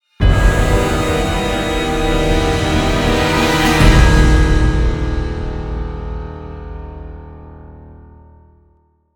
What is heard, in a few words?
Discovery heavy